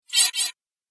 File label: Droid Activation; robot; sci-fi; Robot Sound Effects